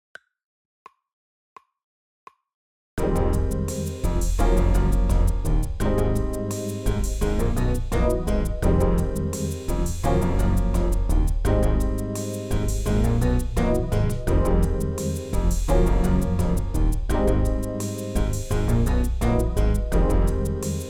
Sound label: pasadena drumless